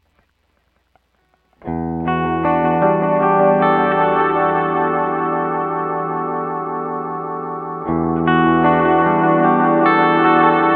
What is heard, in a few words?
Ambience